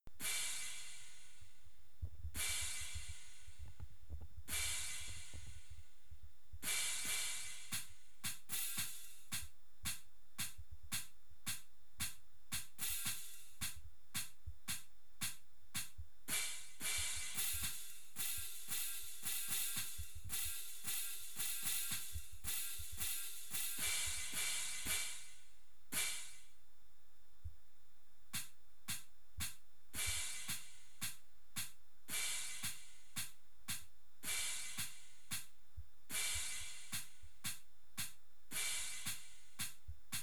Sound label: cymbals